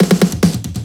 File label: Riddim Drum Fill (140); Drum Fills; Ghosthack; Riddim Essentials 2020